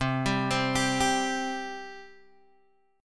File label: C 5th, Guitar, Open G